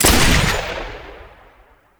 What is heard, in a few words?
Mixdown Shot; Lex Prime; Trinity Prime Boss; Warframes